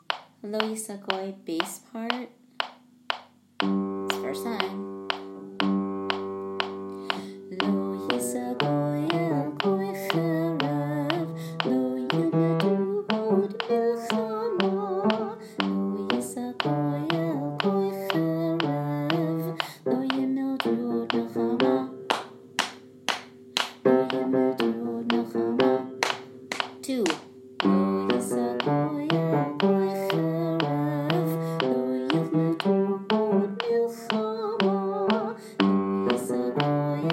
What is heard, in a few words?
Bass; High Holidays; Lo Yisa Goy